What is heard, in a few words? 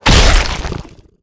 DMG HIT